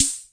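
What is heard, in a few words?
Hammond drum machine snare hi hat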